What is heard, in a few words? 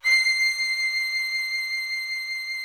VIOLIN C6; VIOLIN+VIOLA; Greytsounds VOL-1